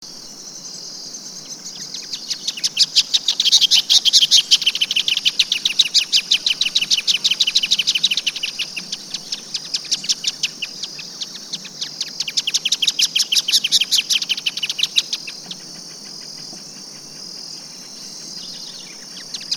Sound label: Band tailed hornero